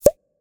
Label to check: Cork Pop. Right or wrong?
Right